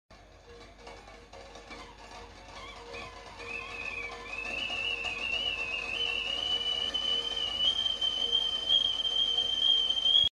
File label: The mettal kettle boils and starts whistle very loud